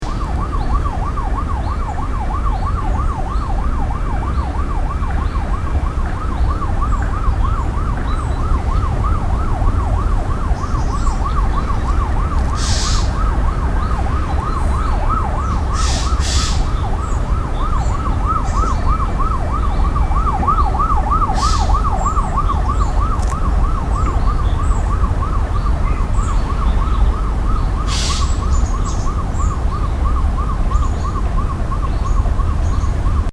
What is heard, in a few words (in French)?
vibrato sirene